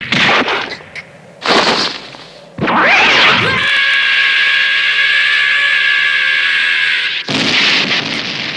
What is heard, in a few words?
fall